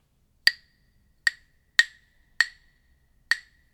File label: claves